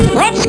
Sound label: pain; QUAKE2